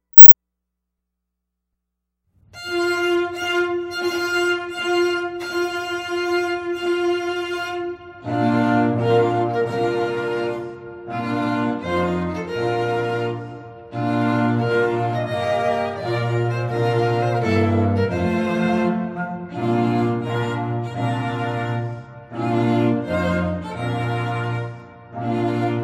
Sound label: Bridal Chorus; Wagner; Classical; Heritage String Quartet